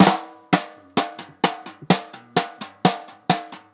PERC, Balkanish Traditions

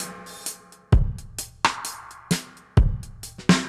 Drums A Wet 130, dub drums; musicradar

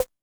999 Snare Pitch, drum kit; Concrete Collage Drum Kit; Concrete-999

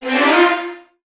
VN FX, Virtuoso Strings

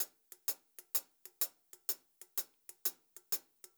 126 CL HH R, Pop-Rock Drumloops; USB Soundscan vol.37; AKAI; 90 s Sample CDs

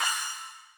crash, Millenium MPS-400

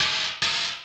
High Hat, Drum Machines E-MU Pro-Cussion

